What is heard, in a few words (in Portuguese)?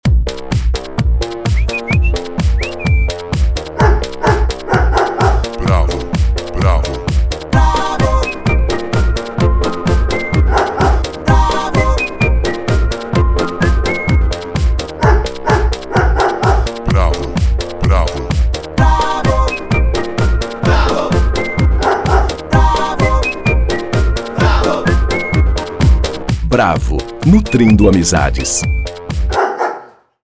Jingle; Bravo Nutrindo amizades